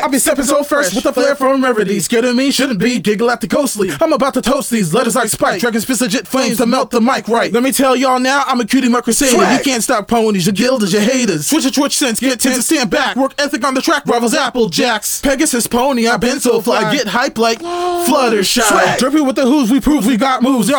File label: Full Verse, Vocal; Vocal Stems; 120BPM; Pony Fan Music Remix Stems